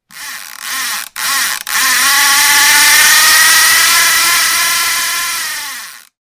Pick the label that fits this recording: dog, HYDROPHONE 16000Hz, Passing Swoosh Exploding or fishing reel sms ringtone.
fishing reel sms ringtone